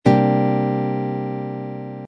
c dominant seventh suspended second chord voicing, guitar